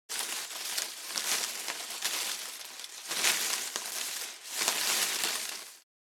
Bush leaves foliage movement

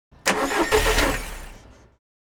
Car ignition failing

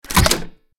Rocket launcher loading; artillery

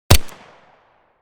Beretta handgun single gunshot close

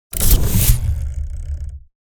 Gritty texture with air release, steampunk; gfxsounds

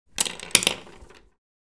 Paint brushes setting down on the desk

